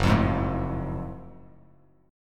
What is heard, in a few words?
F sharp Minor Major 9th, Bass Standard; strummed; 2,8,6,2; down